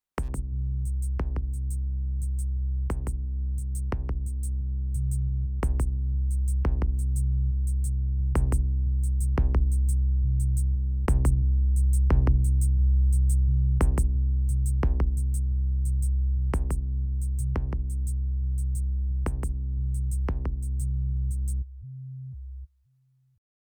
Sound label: Fredenstein COMP SE MIX